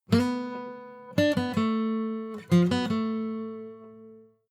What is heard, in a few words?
New Wave Bossa Nova (Guitar); majora's mask 20th anniversary tribute